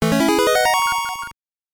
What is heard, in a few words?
Jingle; Lil' Monster; GBC